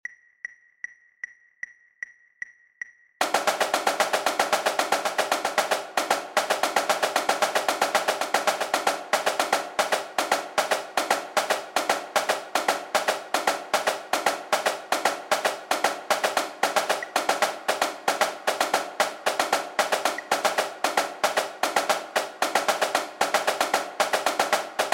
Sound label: sequence double snare